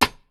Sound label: perc, Roland MC-909